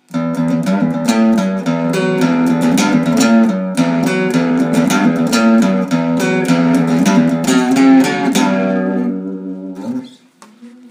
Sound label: Lick Pedal Point